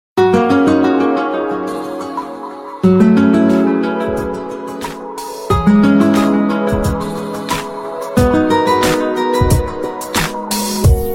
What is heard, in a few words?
Nokia N96